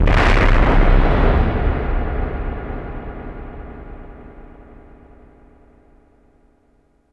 SFX, synth atmos & fx; Dream Zone

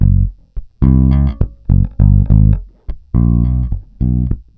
Pop, Bass

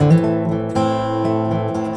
GUITAR 120

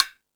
Percussion, Drum Machines E-MU Pro-Cussion

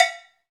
COWB, Cow Bells, Roland; Club-50 - Foundations Roland; CowBel+Metl

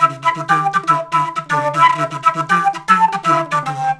flute, disco; Best Service ProSamples vol.48 - Disco Fever; AKAI

